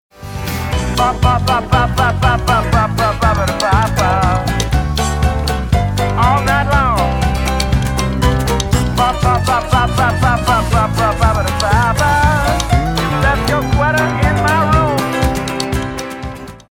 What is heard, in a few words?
Tack Piano